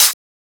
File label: Closed Hat